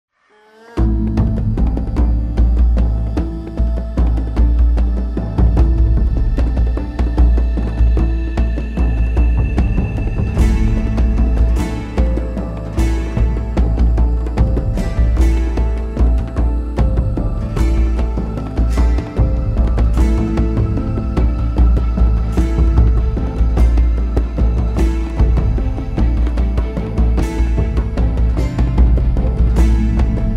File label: Loop Carefree; Game of Thrones: A Telltale Games Series